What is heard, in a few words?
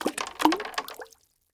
Liquid Water Marble Dropping Into Water In Plastic Tub